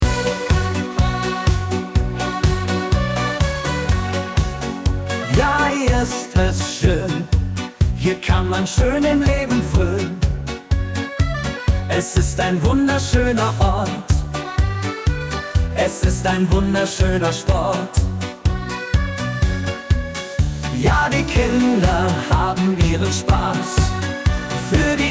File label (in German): Hockey Lied; Forderverein Hockey; hockey jugend